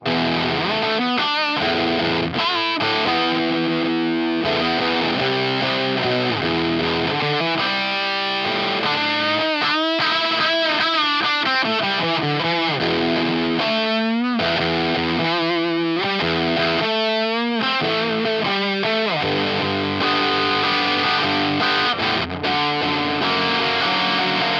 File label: fuzz drive full treble15 blend 15